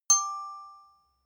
notification glockenspiel fifth

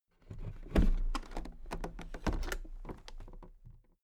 toolbox open soft

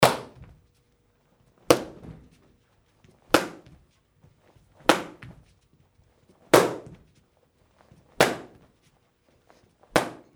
karate kick pads

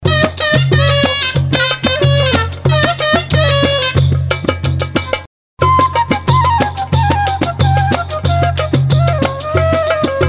oriental flute percussion

